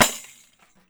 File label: PANDEIRO, Steel Percussion; E-MU Producer Series Vol. 7 – Old World Instruments